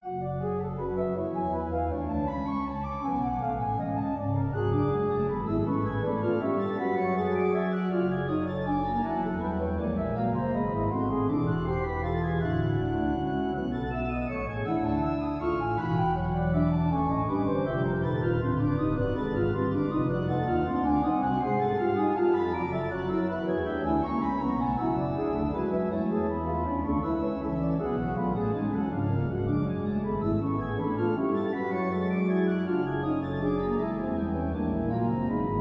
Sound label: Organ, Trio; aJHR Organ Book XII III Trio